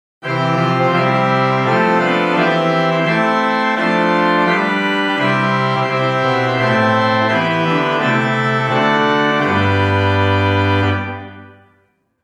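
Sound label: Great Open Diapason large Principal Fifteenth Mixture Trumpet Clarion; St Annes Moseley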